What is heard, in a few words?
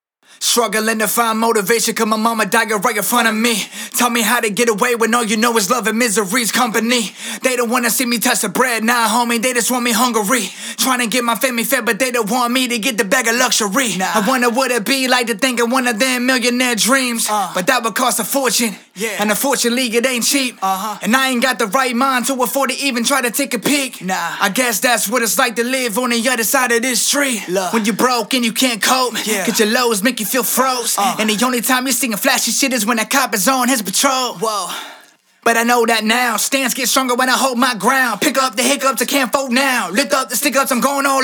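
hip hop 70 B flat minor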